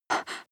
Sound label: breath female short